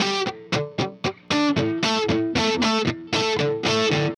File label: Riff Guitar, Indie Rock